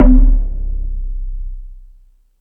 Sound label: Multi FX